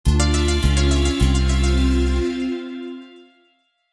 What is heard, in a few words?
Jingle Found